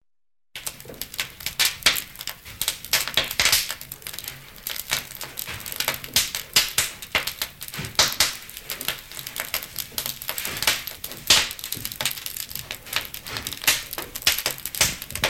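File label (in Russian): горение огня и движение поленьев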